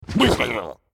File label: Zombie Hit; Character Zombie Hit